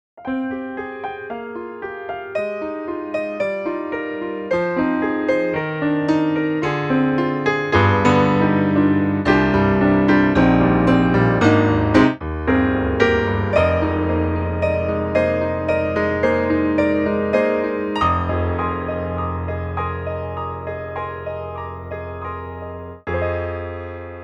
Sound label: Giga Piano